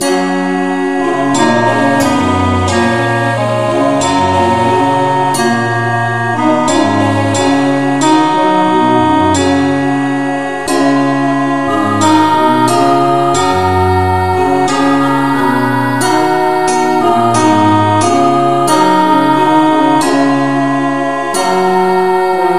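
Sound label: no vocal, key G flat; christe Sanctorum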